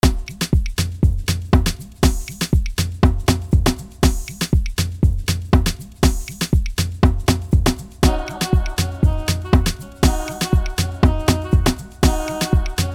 Instrumental

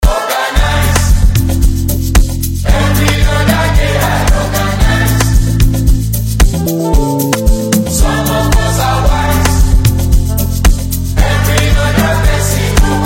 Instrumental Hook

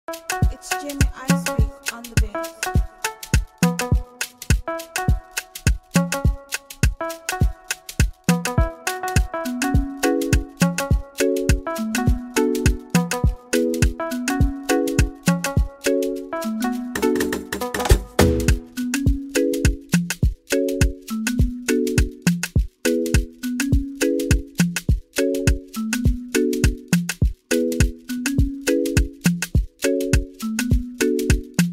Afrobeat Instrumental

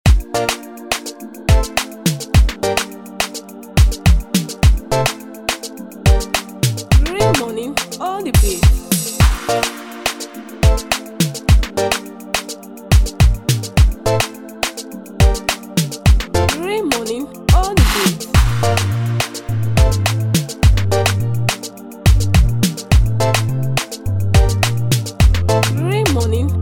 Afro HighLife Beat

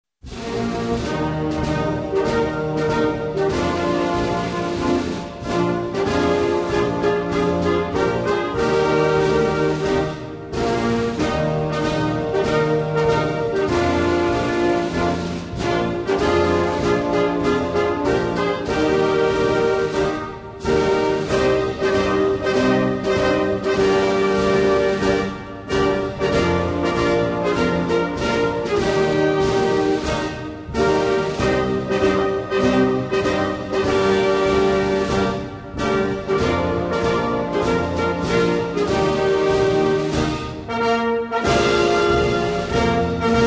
Angola national anthem

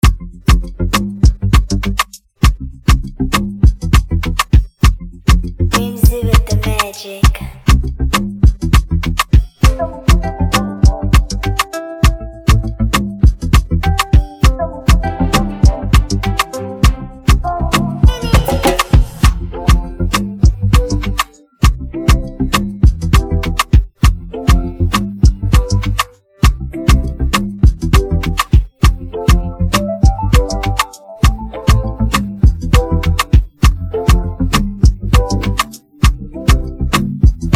Instrumental

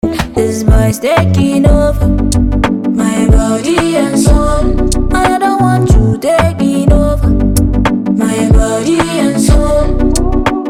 Instrumental Hook

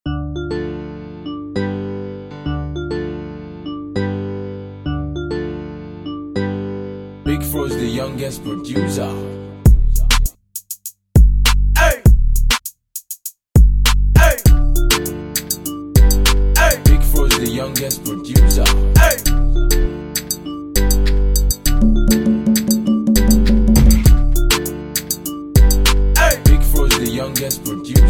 Instrumental

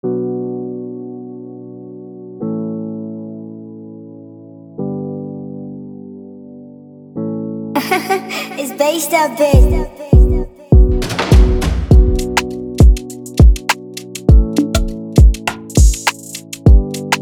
Free beat 101 bpm B minor

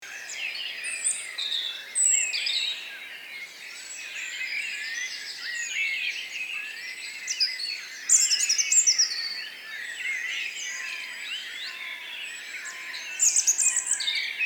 Birds sound effect; bigsoundbank

